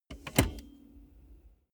car bmw e46 interior seat adjustment lever

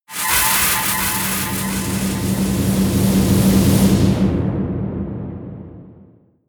air screaming vortex high; laerm

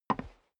step boots wood creak single left; Footsteps; schritte

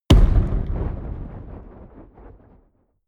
fire impact; explosionen; comedy-cartoon; soundpool

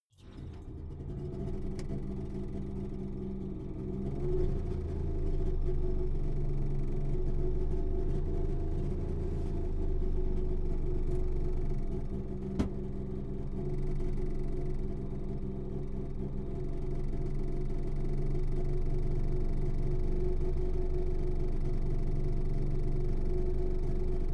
car saab 92 startup idle interior

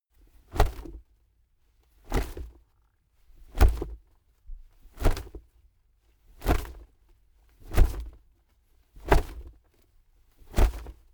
wings flap; natur-tiere; voegel

